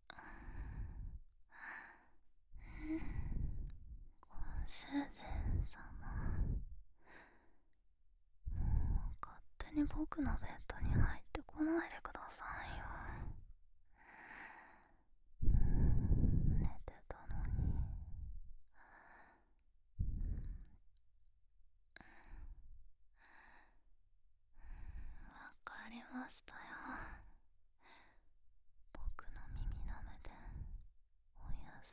添い寝耳舐め; ASMR

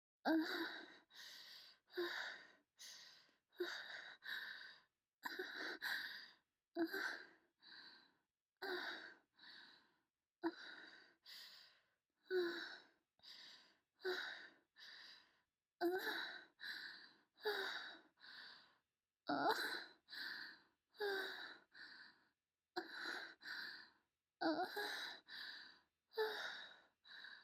呼吸音～強め～; 黒羽

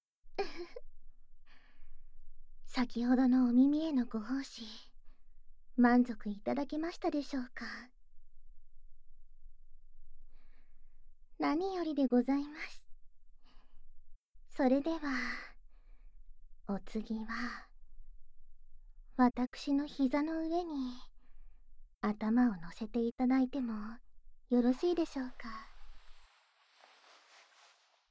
とろ甘吐息・ゆったり耳触手 耳かき（効果音あり）; 夢魔の耳かき

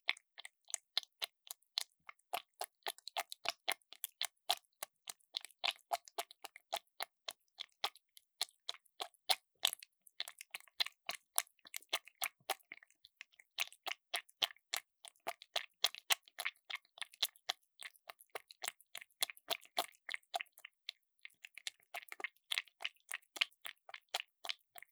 クチュ音 ノーマル～高速

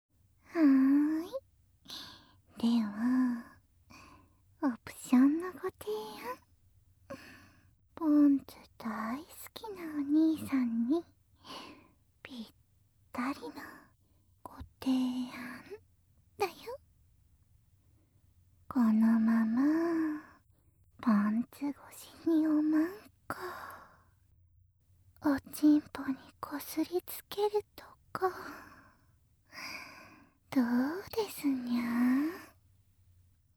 パンツ素股で耳舐め, ASMR

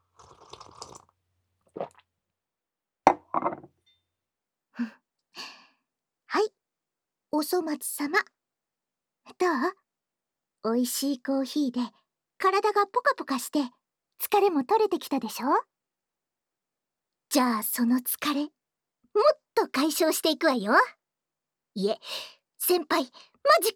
骨抜きマッサージ？（吐息、肩もみ＆首のマッサージ）; センパイの骨抜きマッサージ？

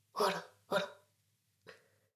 しこしこ; ダウナー風俗

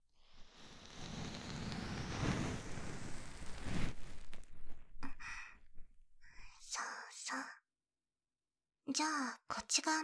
左耳かきパート, ASMR

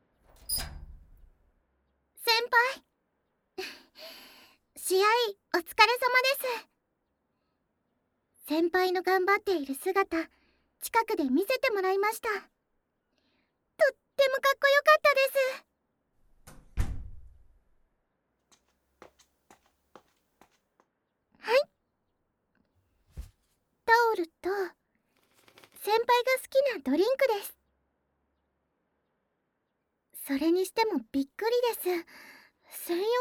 耳舐め手コキ; 耳舐め手コキで身体と心のケア

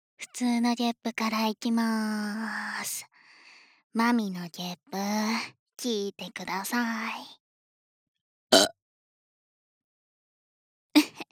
基本 ゲップ; 実技面接1

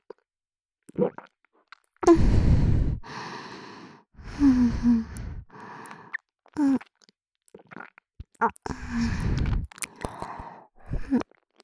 ごっくん…♪, ASMR, 左耳

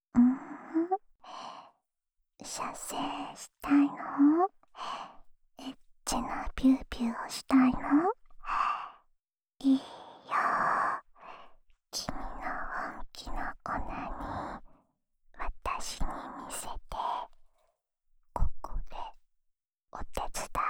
オナサポ用耳舐めループ（左耳）, ASMR; おま○こ係２

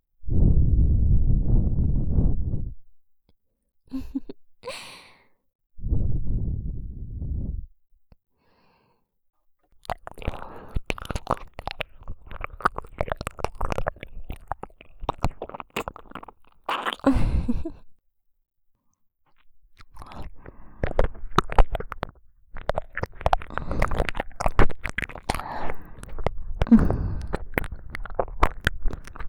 耳舐め

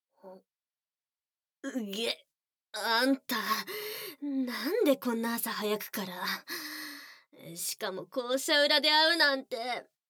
耳舐め手コキ; 性欲処理を日課と誤認させて耳舐め手コキ

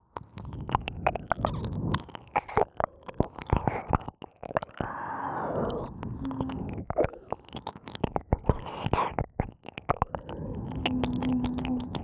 左耳舐めループ; ループSE無

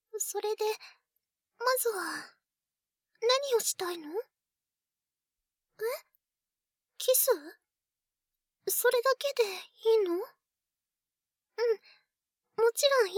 みずき と キス; ASMR

